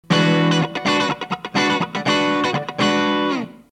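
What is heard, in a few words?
082, CLEAN, TL082CP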